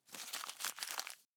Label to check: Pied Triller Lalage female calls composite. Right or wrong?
Wrong